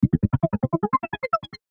ASCEND CHOPPER, Glitch, High; Special, Fx, Ascend; Multimedia; Production Element, Imaging Element, Accent, Transition; Blastwave

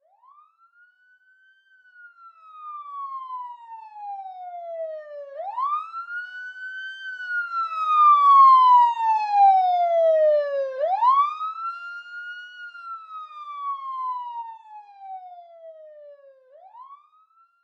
Emergency Police Siren Pass By; Sirens (Sound Effects:Electronics)

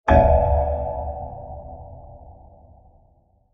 Bright Hollow Metal Impact; Blasts; Impacts; CraftedSignals

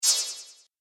App Game Spell, magic; Magic Sound Effects

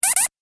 Arcade Game Double Squeeze Squeak; Retro Inspired Sound Effects - Think Nintendo, Atari, C64, Etc.; Andrew Fleming

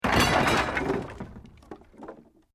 Wood Collapse

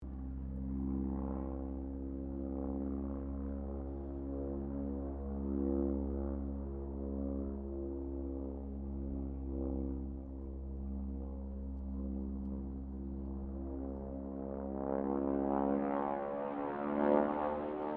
AIRCRAFT AT-6D HARVARD MK III LOOP; Temora Harvard MK III; Airplanes; Vehicles; Airplane Sound Effects

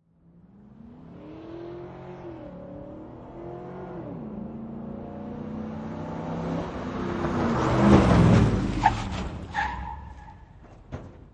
Truck 5.3 Liter Driving By And Skidding To A Stop; Auto, truck, motor: 5.3 Liter Truck / Lorry: Drive by and skid to a stop.

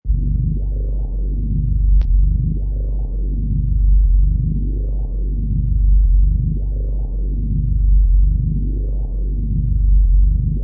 Crzy Evl Bass, Deep and creepy evil bass sound; LOW FREQUENCIES; Deep Tones; Crazy Evil Bass; Sound Design

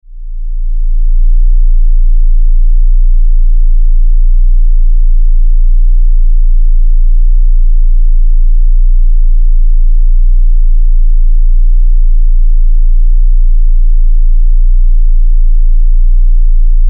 Drone Lfe Deep Bass Hum; Blastwave

